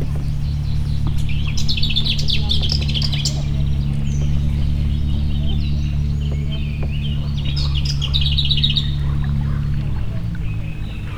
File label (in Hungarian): házi rozsdafarkú; Tiszavalk